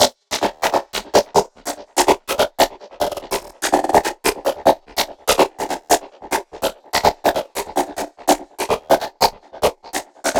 Lead Line D 145 BPM, psytrance; Lead Lines; Far Beyond

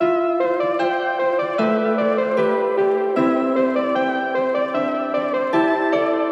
Nostalgic Melody Loop - 152 BPM A Min; Cymatics; Oracle Sample Pack

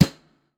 Maple Brush, snare; Drums; Ghosthack - Free Snares 2023

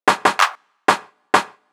Drum Roll 95, Afro Dancehall; Afro Drums; Fills & Rolls; Ghosthack

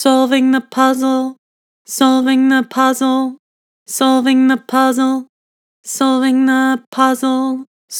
Vibrato, Vocals; Harmor Vocals

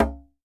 Djembe - C#; percussion; Cymatics - Infinity Beta Pack 2.0; Zeus Percussion Collection

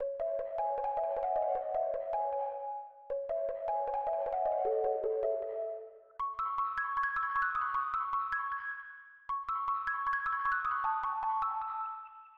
155 BPM C# Min Bell; Melodies; Loop Stems; Cymatics; 2022 Melody Mystery Expansion; Freezer

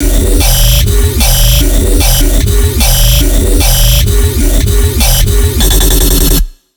150 Robot Pattern, bass loop, dubstep bass

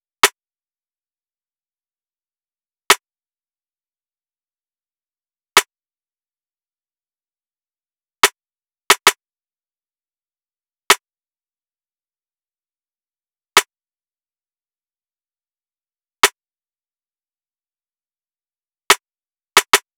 Drum Loop 90 BPM Rim, RnB; Distraught; Cymatics; ANALOG EVOLUTION Beta

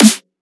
Snare Dubstep; Trap and Dubstep

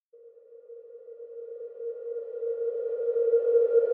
Reverse FX; 122bpm A#min; Construction Kit; Ghosthack; Summer Freebie; Acapella Kit

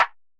Perc; Ringo; Cymatics; Trap Starter Pack; Percussion

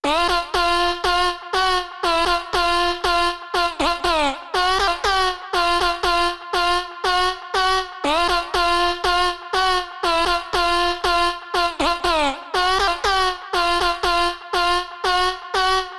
120 BPM F Min Vocal; Melodies; Loop Stems; Cymatics; Foolish; PHARAOH

